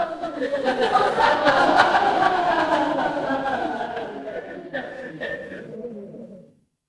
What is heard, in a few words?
crowd laughter